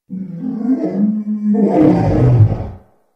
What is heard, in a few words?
monster growl; silent hill 3